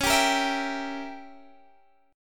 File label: C sharp Diminished 7th C sharp, Guitar Half Step Down